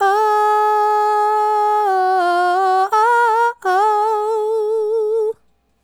Female Solo, Hip-Hop and RnB Vocals

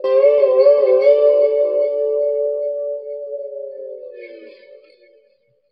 Guitar FX, Stringed Instruments, World Pop; Guitars; Sonic Foundry (Sony Creative Software)

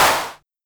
Simmons Clap

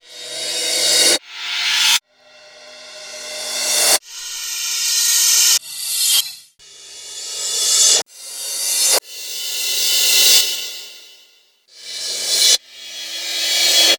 Reverse Crashes

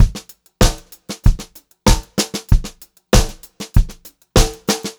VARI, Pop-Rock Drumloops

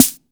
HOUSE DRUM SD

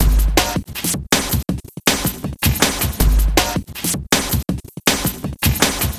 Beat, Futurist Drum & Bass; Drums & Percussion

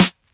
snare organic

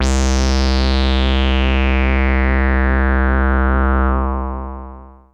Analogue hits and octaves

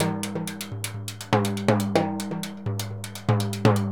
TIMBALE, Percussion; ILIO; Synclavier Percussion 2; World & Orchestral

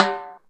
World, Yamaha DD-20

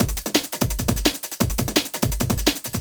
170, Breaks; Drum&Bass; EZ Rollers

